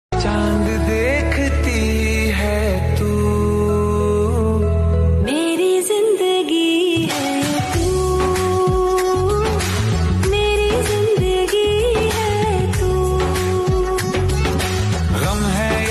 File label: Ringtone